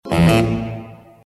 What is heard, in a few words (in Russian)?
ta dam